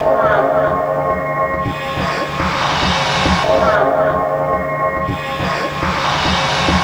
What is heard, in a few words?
FX Loop, Drums & Drones